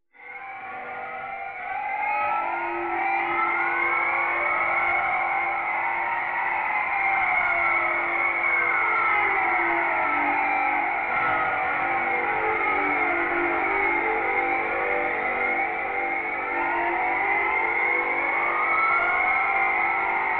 It was screaming the whistle wave

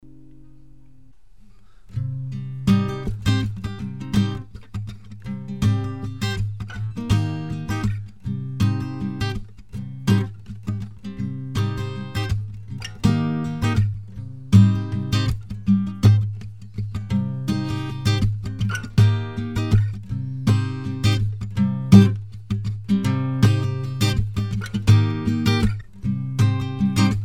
GTR

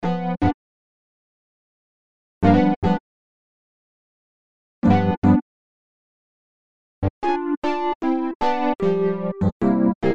e piano loops

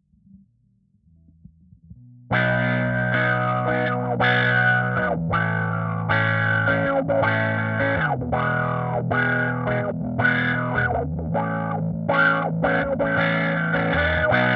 E160 Strummy GTR chords

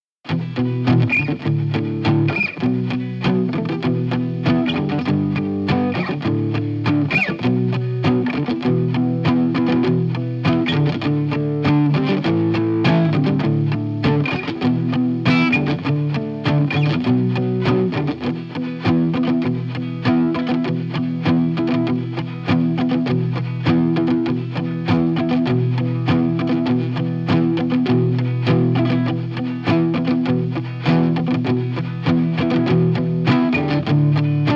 Em100 Groove; Joans Groove

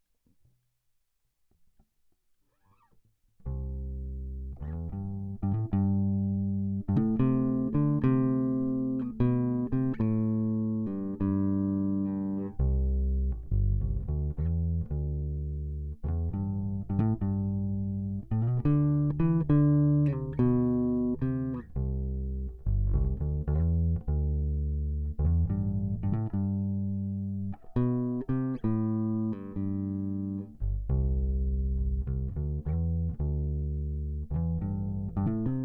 Acoustic Repair Job; admiralbob77

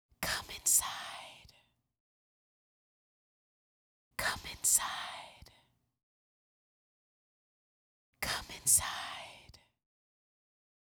Come Inside (Backup Vocals)

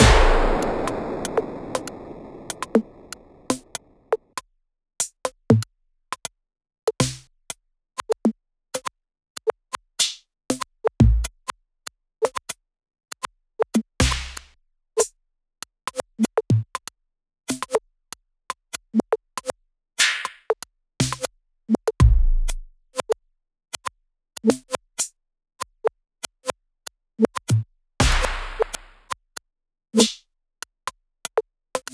drum dry

